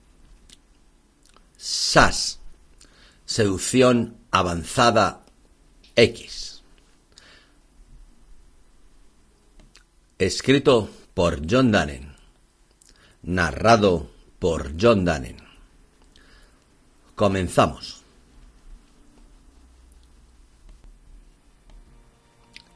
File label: sax; S.A.X. seducción avanzada X audiolibro